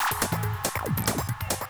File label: TECHNO BEATS 140